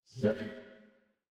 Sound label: ui chime